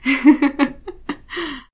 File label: giggle female